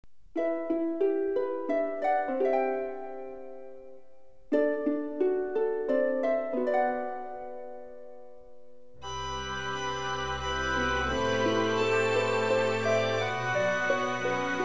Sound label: piano theme orchestrated; ff10